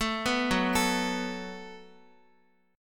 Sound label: Gb Minor Double Flat 5th, Guitar; Gbmbb5 arpeggiated; x,x,7,4,6,4